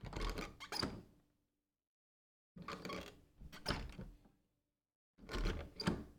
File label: door handle, household; NS Studios - ultra sound collection